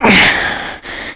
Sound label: player cliff jump impact; Call of Duty Modern Warfare